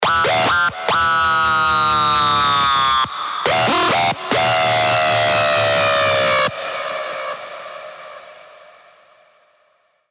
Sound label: screech; Cochlear Seizure; Revealed Serum 2 Screech Pathogen